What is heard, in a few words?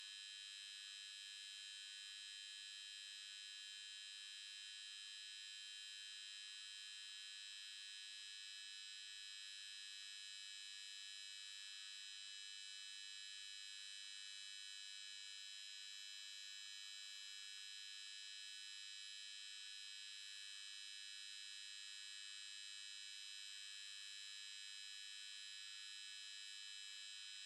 The Electric Hum Of A Street Lamp By The Roadside